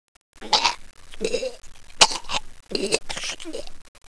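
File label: water death; wizardwars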